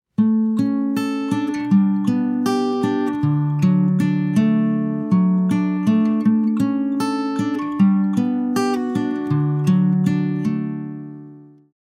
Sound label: Guitar Raw